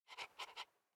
dog breathe; smite; god daji skin15

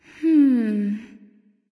NV Thinking; smite; awilix

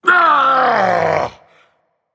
Ouch, Dragon; fafnir

